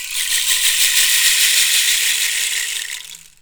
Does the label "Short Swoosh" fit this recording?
No